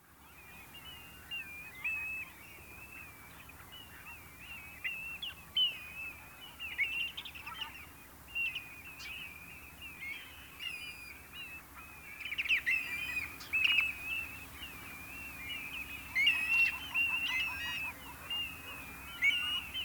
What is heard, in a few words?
Redshank, birdsong